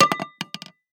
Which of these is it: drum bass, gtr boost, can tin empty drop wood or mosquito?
can tin empty drop wood